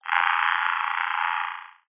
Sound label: science fiction radio receiver transmission interference burst growling static; zapsplat